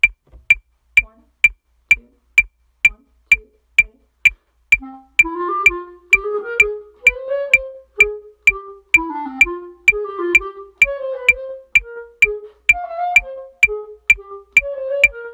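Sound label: trumpet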